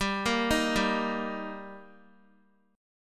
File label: G Minor Sharp 5th G Guitar, chord; Gm#5 arpeggiated; x,10,8,8,7,x